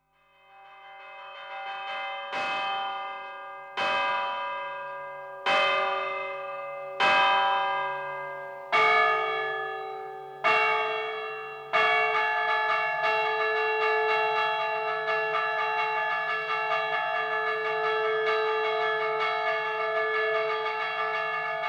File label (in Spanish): toque a concejo, campanas